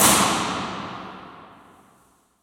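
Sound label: C Street Garage Stairwell, Impulse Response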